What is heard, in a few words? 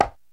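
RX7 conga high muted; Crypton - DrumBase5000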